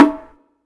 Low Bongo Ring; Drums; One Shots; Percussion; Groove Elements

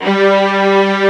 Section String G2; Proteus 2000; Digital Sound Factory Proteus 2000

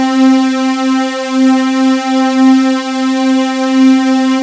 SAW SQU; S-LEAD; MKS; AKAI S-Series CD-ROM Sound Library VOL-8

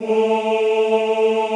OOH A2, Classical Choir; Best Service; Extended Classical Choir

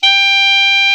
KLARINET, Alpine Volksmusik; Best Service

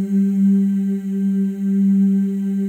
FEM, Symphony of Voices; Spectrasonics

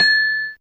Tel Bell A4; Fairlight CMI IIe

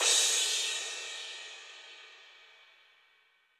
crash, crashes

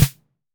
Snare

